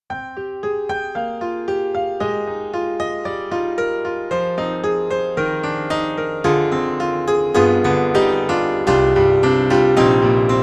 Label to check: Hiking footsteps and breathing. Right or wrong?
Wrong